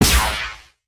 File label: Barrier Shock, ambience spaceship